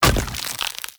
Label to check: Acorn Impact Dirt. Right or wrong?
Right